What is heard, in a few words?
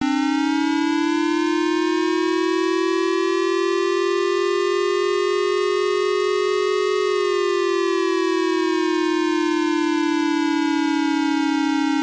siren missile launch